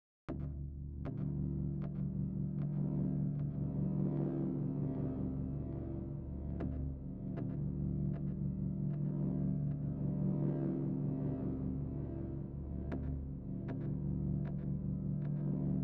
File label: Bass